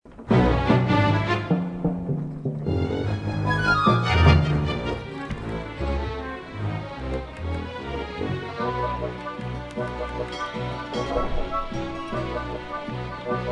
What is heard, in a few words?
More Swordfighting; monkey island 3